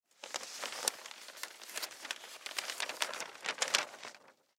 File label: Newspaper Page, Newspaper Page Turn; Foley; Paper Sound Effects; Materials; Blastwave